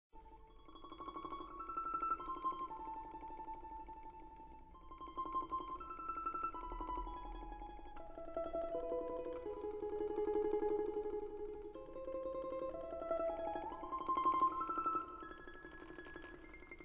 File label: tremolo